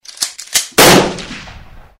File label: Shot Gun